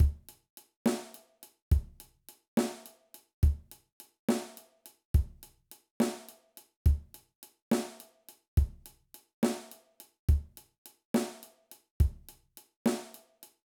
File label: Groove 68 Drum Beats